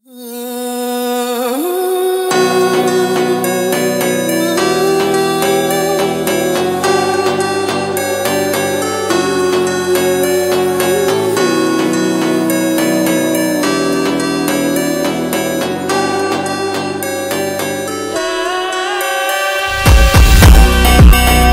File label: brazilian funk phonk